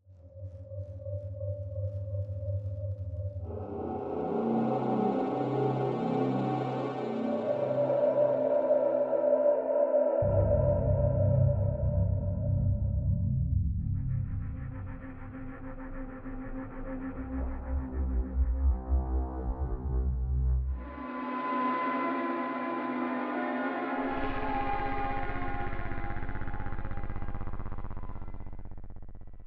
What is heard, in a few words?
Athmos